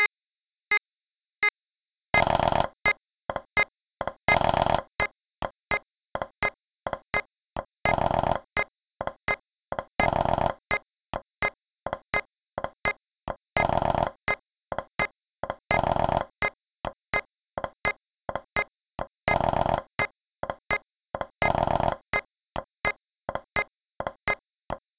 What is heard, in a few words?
Snare 6 8, 84bpm